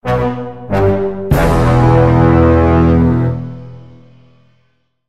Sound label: Stage Lost